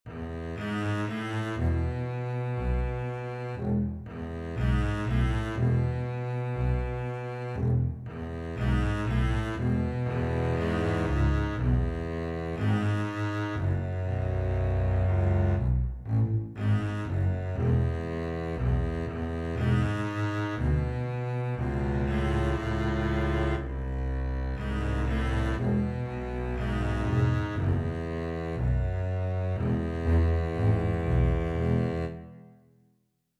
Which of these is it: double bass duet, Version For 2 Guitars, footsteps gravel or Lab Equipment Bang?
double bass duet